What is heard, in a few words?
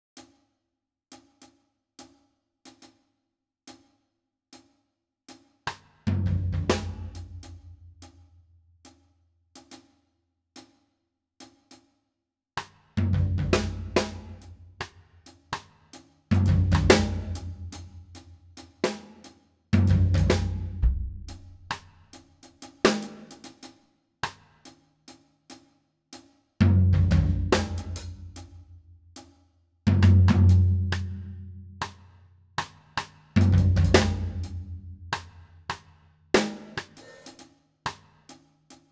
Drum